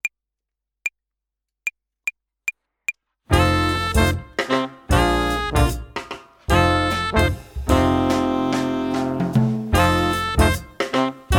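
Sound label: Good News Stomp, Play-along, Jazz Club Klavier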